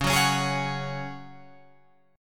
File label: C sharp Suspended 4th C sharp down Guitar Open F; strummed